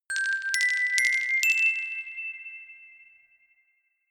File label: notification glockenspiel rattle sequence ascend